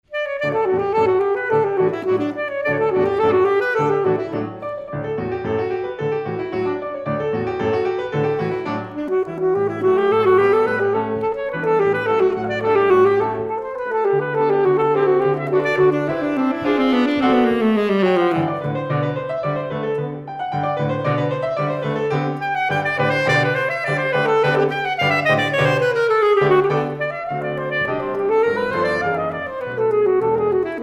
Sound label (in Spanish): Concierto para Saxo Alto y Orq de Cuerdas (red a piano) Rondo; Dubois